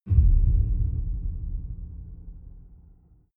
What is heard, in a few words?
far outer space shadow, hits impacts